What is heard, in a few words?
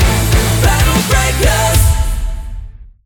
Battle Intro Stinger